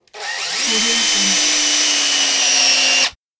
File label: cordless drill